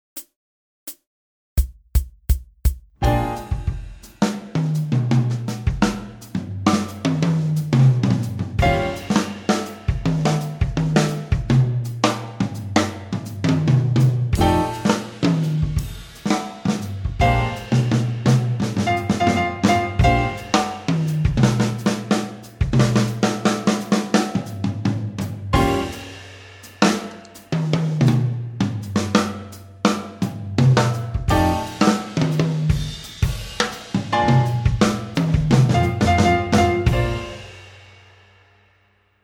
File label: drums blues samba; transcribing solos